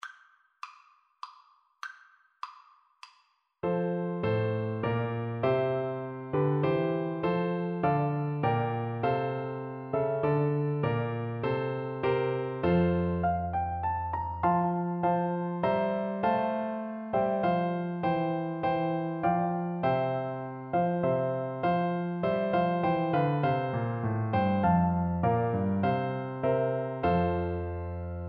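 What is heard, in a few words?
recorder karaoke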